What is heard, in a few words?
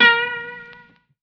plucker, Guitar; Notes